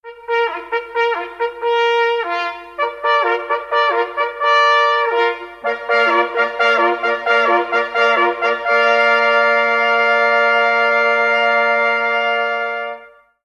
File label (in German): jagdsignal klingelton halali sau tot; jagdsignal halali ende